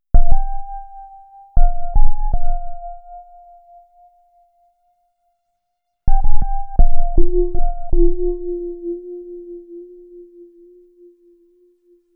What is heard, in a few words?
Little Tremelos, Electro Lounge; Sonic Foundry (Sony Creative Software); 081 Laser Love